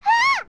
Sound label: Gasp; Ruto